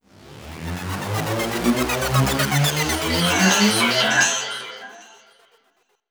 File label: Power Up